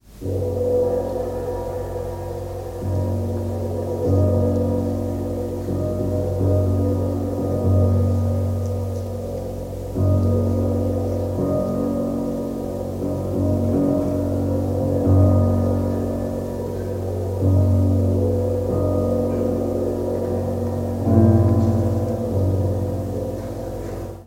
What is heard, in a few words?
Gong; Redunoise